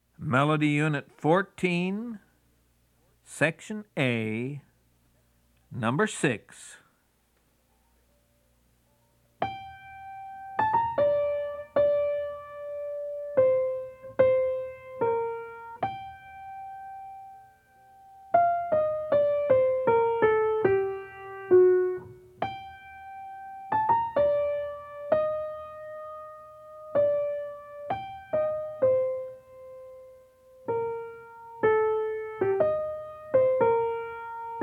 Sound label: melody, ear training recordings